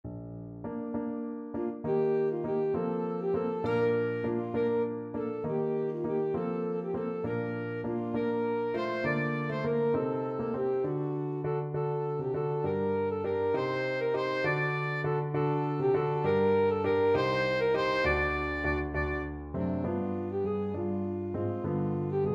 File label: siciliano, saxophone; scarlatti